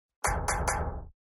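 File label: knocking door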